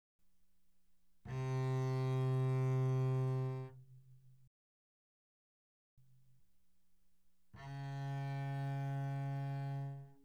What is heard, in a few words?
Bass arco mf sul G C3 B3; spiffyscore